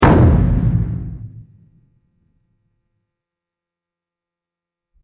big explosion

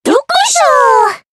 default beep windows; hanamaru

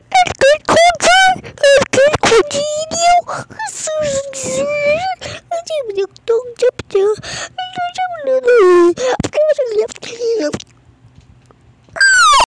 cringe uwu aaaa goofy ahh lalalalalla

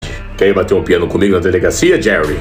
piano

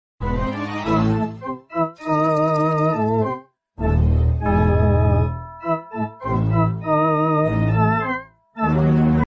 spooky music

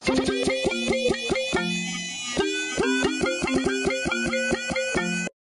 music

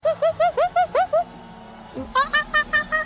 uhh uhh

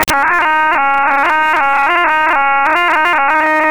haaagaaagaaagaaaaaaaeeeh autotuned

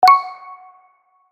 alert interface button

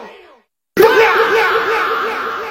fahh mix

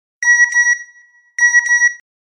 sms; nokia

